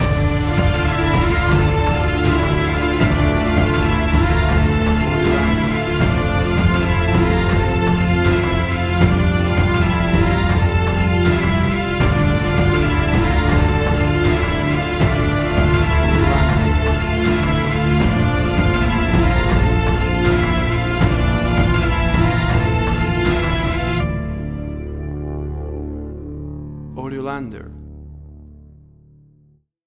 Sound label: Big Orchestral Finale